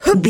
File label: voice female effort jump action